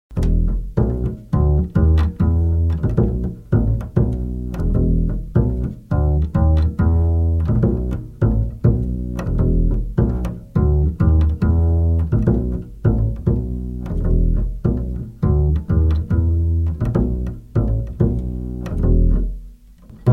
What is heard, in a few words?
BASS